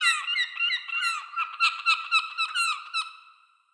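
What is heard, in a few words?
ambience sea birds; War Thunder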